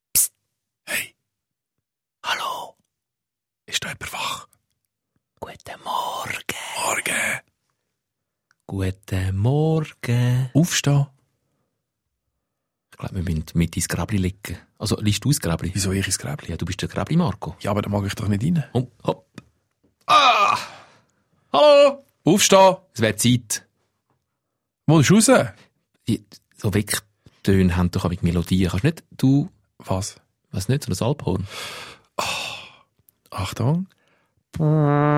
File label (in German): klingelton